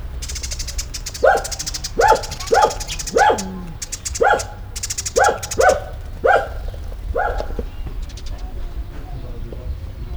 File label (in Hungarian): puli verebekkel, kutya; állathangok; nyíregyházi zoo 2010